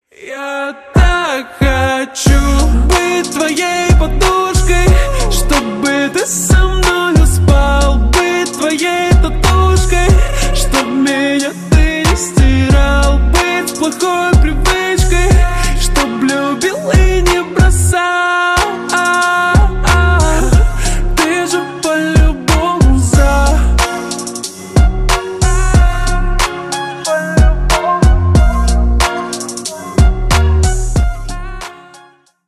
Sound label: slowed reverb